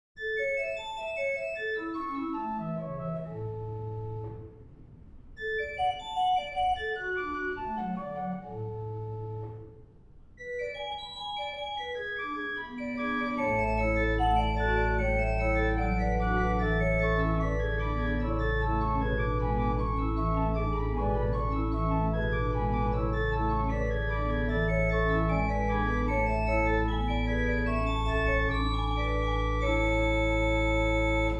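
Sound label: Prelude In A Major, organs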